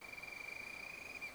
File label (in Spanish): canto bobito, Bobito de Puerto Rico